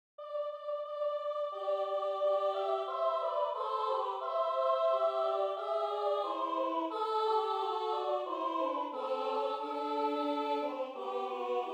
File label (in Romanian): Sculati sculati boieri mari, Basarabia, colinde